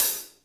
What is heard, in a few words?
HATS, Interactive Drum Kits